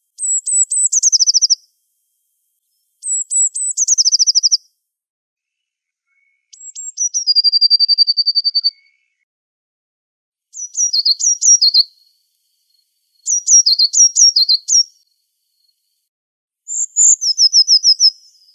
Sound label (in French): 5 types de chants de Mésange bleue; ATLAS NICHEURS BRETONS 2004-2008